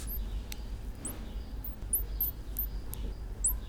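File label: Diplomesodon pulchellum squeaks juvenile; piebald shrew; Squeaks produced by a one-day-old pup, taken out of the nest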